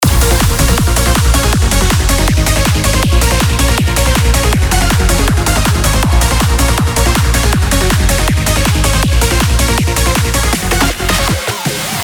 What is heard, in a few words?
loading music